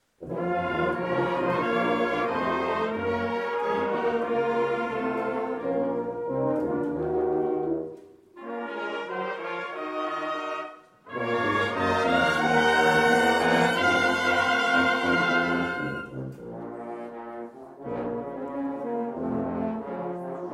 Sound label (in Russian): Духовой; Возрадуйся душа моя; Молодежное общение Приготовьте путь Господу; Саки